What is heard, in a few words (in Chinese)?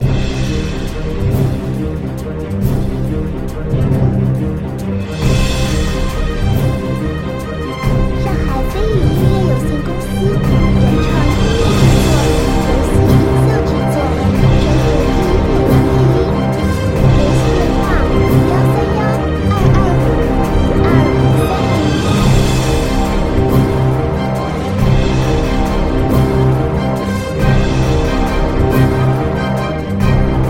紧张激烈压迫; 无尽战歌